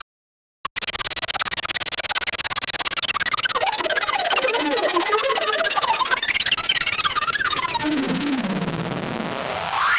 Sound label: video tape glitch